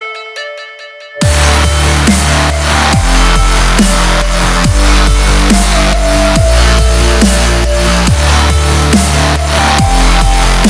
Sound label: Static Function, dubstep